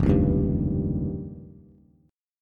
F 7th Suspended 2nd Sharp 5th, Bass, Bass Standard; F7sus2#5 strummed; 1,4,1,0